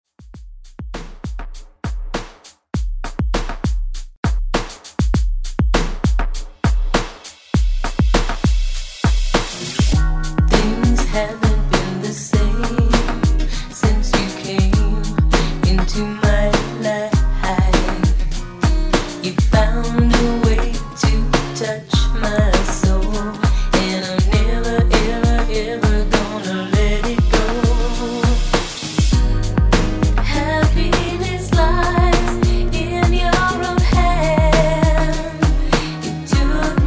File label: 90s ReDrum 100 Bpm; DJDOLLS